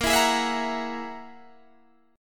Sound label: G sharp 7th Suspended 2nd Sharp 5th